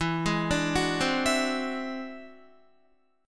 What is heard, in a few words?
E Augmented 9th E down Guitar; E+9 arpeggiated; JGuitar